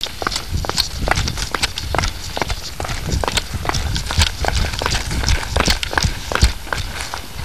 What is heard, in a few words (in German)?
schritte; krampnitz; Handshake-Feldreise